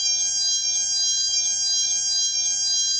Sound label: stall, c172p